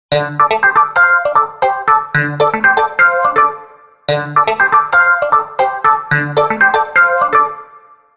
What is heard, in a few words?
Ring Snappy, Windows Mobile ringtones; Microsoft